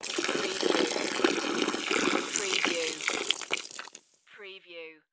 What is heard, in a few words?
Bucket Pour On Grass; sound-effects-bible